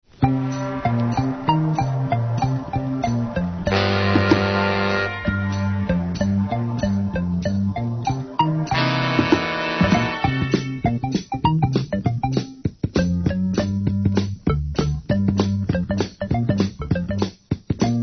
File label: flute